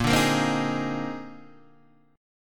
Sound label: Bb 11th, Guitar, Open D